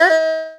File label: slide; cookie run